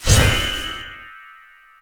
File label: Fx Transformation; Levels Hub Diner; Light Street